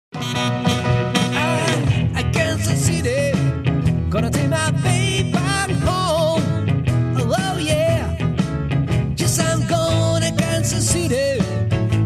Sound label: blues